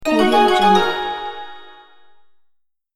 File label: china bright notification